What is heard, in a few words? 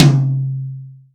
high floor tom one shot c key, Royality free tom sample tuned to the C note.